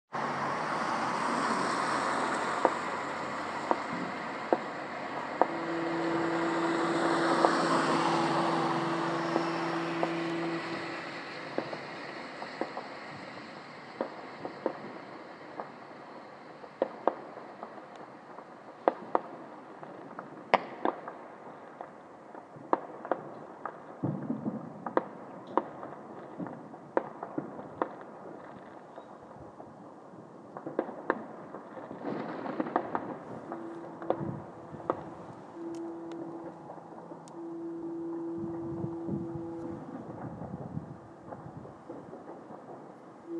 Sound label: glasgow new year